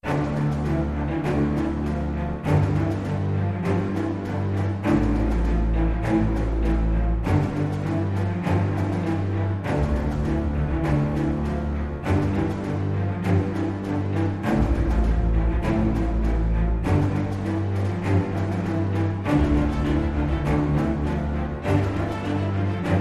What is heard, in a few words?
No Choir